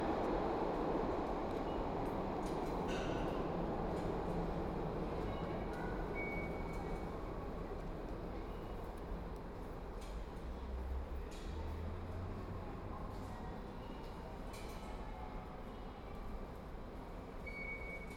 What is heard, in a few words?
Subway Station